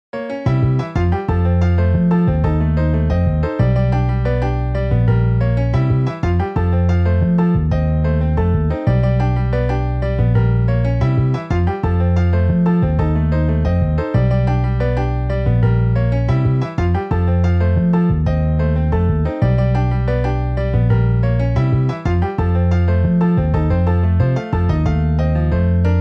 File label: Piano Bass; IRE PRODUCTIONS